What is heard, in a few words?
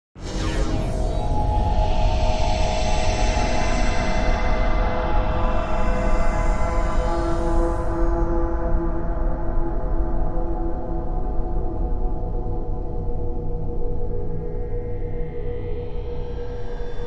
Stab Elongated Laser Fire Stab with Shimmers, Wash